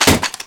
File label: weapon impact hard, metal; physics